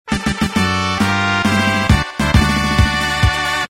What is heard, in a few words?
fanfare movie